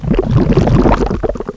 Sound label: lava exit